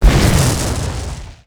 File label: explosion; buraq